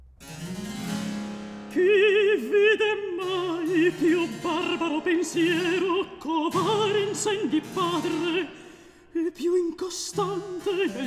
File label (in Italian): Recitativo; Antonio Vivaldi (1678 - 1741); Vivaldi - La verita in cimento (2003); Atto II, Scena 6; (Melindo)